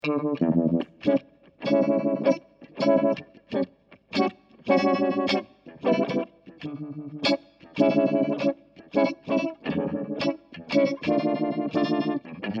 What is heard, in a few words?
phaser full depht speed